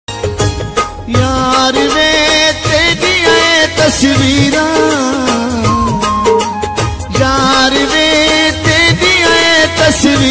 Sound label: sad ringtone